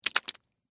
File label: Drip Splat Tomato; Blast Wave